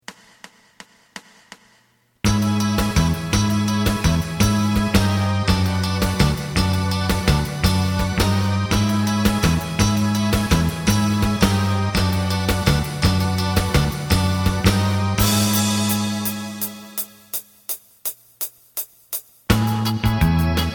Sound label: lead